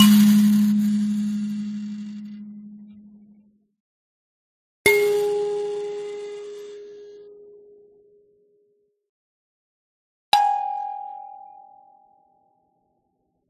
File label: mbira b flat